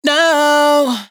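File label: riff no E minor, Vocals, Dry Vocals; Raw Vocals; Slate Digital Omnivox Vocal Sample Pack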